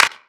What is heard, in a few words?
Clap; Equipped Music; Loungin House